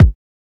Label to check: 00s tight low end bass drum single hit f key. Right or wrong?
Right